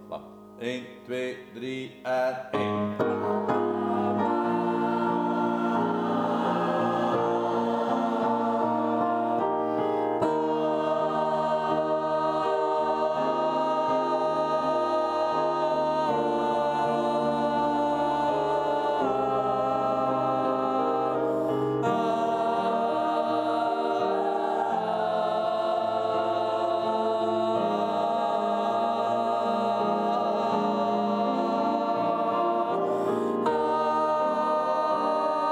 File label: bas music